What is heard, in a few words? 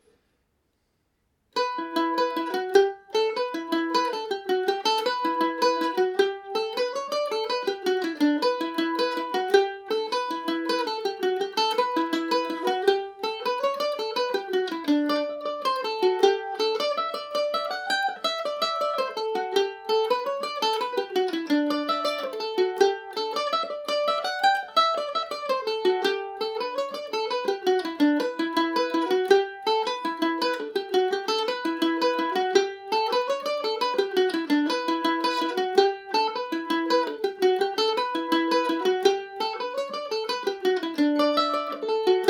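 E dorian, Irish mandolin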